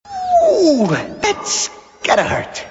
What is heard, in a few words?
death; hl2dm